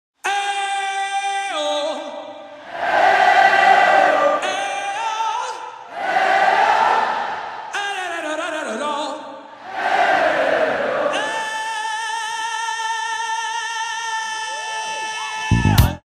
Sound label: Ey-o